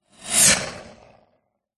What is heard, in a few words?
sport ice skate pass by fast designed; zapsplat